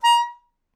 Melodica Short; Short Notes Chromatic

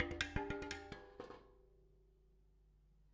Bicycle Spokes

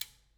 Back Pedal, Bicycle

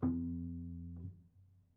Prepared Piano

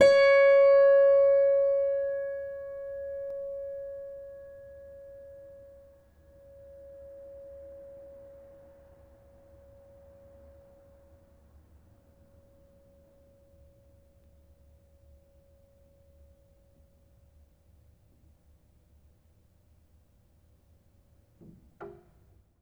String Pluck, Piano; Piano Miscellaneous

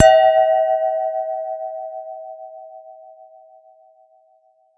Glass Clink, Beer Glasses

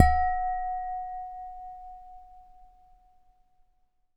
Bathroom, Lampshades

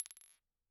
Bottle Struck

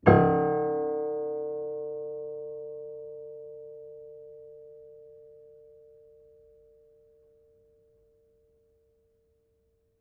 Harm Low, Piano Harmonics

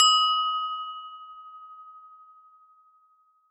Hole Saw; Hole Saw Bits

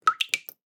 Drips

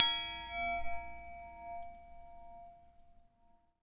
Cutlery Clang; Kitchen

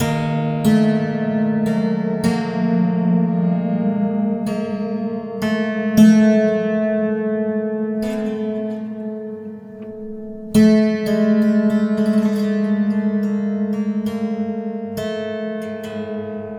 Detune, Piano; Piano Destruction

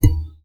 Classical Guitar Extended Technique

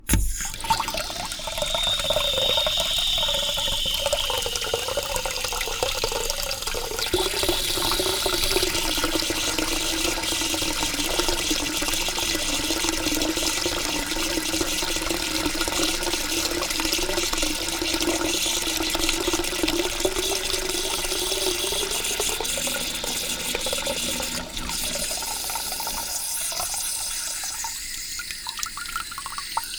Toilet Tank, Bathroom

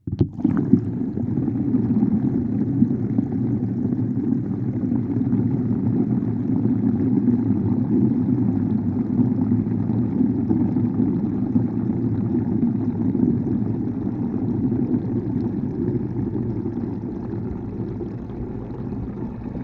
Bath Draining Hydrophone; Bathroom

